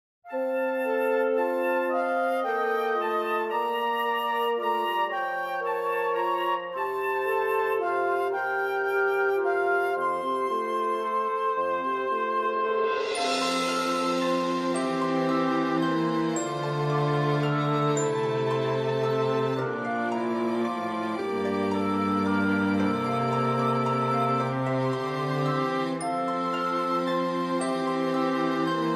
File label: instrumental